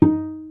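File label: pizz cello d#4; DirectX SDK; DMusProd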